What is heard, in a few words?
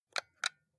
console controller xbox xyab press soft